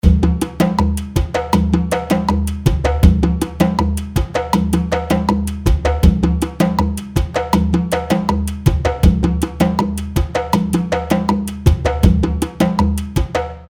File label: Bomba Djembe